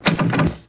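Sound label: Bow Release Multiple; Civ4 warlords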